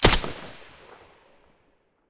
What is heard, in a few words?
m4a1 silencer mono, weapons